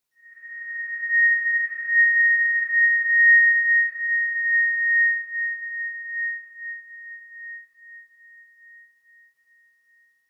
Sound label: sonar Ambience Beacon; Barotrauma; Real Sonar